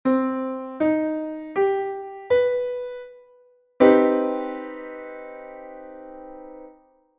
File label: CmΔ7